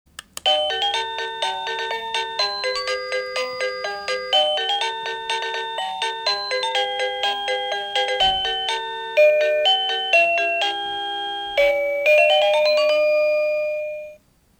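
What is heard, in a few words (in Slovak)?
Bezdrôtový zvonček melódie ukážka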